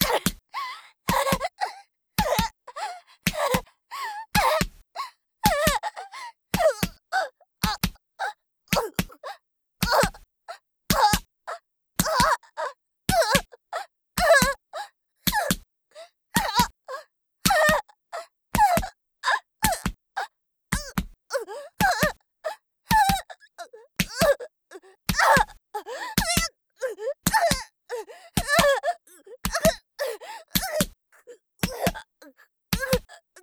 ワンツー BPM110; 効果音あり

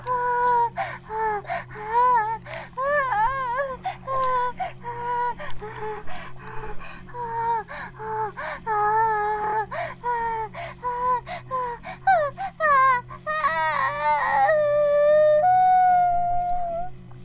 バイブ放置, ASMR

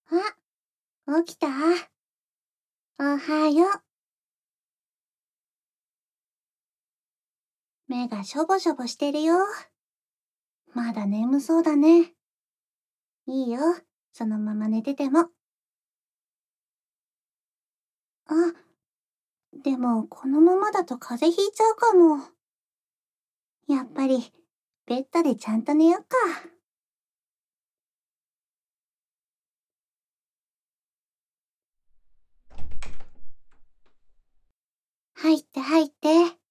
添い寝と耳舐め

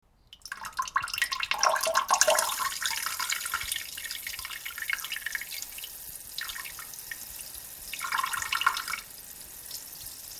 ぽちゃちゃしょおーおしっこ

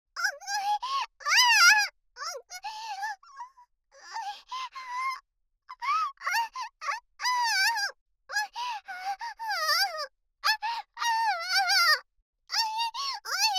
SEX・激しい喘ぎ声と悦びの声; 生意気少女系カノジョ

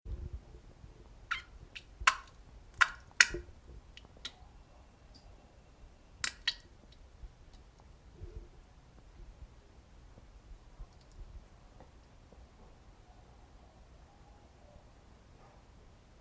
げりおなら なかなかでなくて間が長い

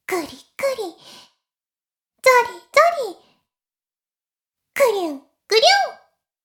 妹 しこしこ音声

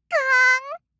ガーン

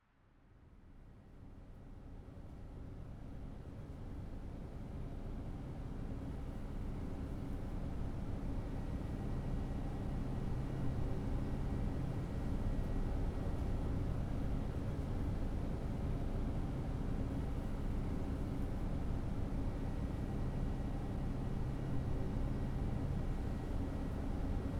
耳かき・PERFECT!!!, ASMR

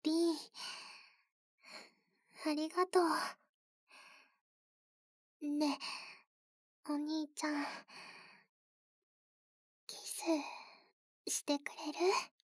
ダブル 耳舐め, えっちな効果音なし; 初えっち種付け♪; BGMとえっちな効果音なし版

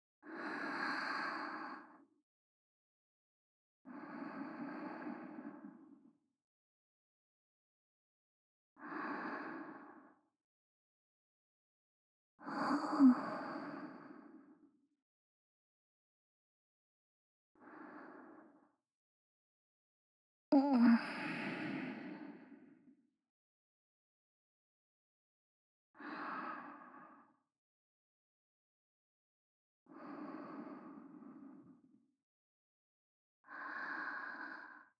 添い寝（寝息）ループ仕様

